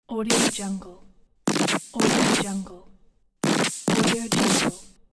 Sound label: laser blaster gun shot